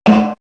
Perc; AMG; Perc One Shots

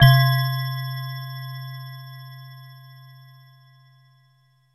JV bell, JV-1080; Syntec; Wall of Sounds VOL-2; BASIC-JV1080

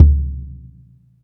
BD, Bassdrums, Roland TD-20

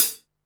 HAT, Techno 138 BPM

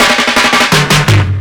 170, fills; Drum&Bass; EZRollers

